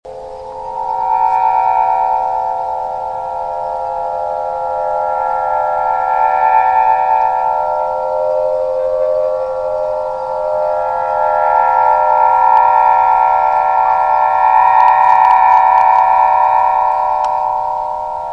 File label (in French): harpe plastic; berck 2004